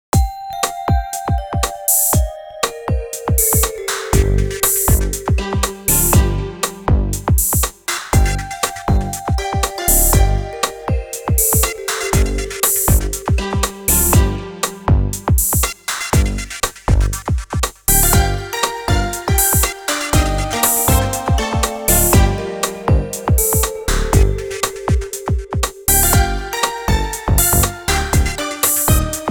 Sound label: Electronic, EDM; 리듬